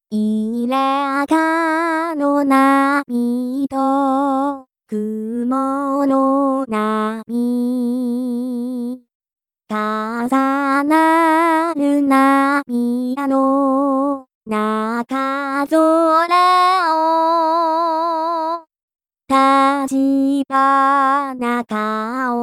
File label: Koinobori, vocal only